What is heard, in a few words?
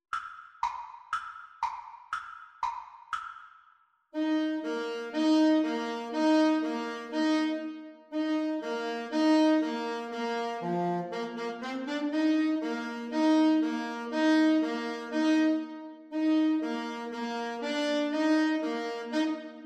baltimore belle, Clarinet-Saxophone Duet